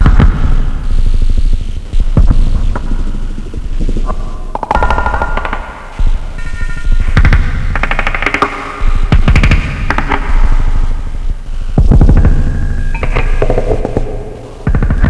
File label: monster, ambience; GoldSrc